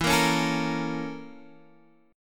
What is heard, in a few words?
A sharp Minor Major 7th Flat 5th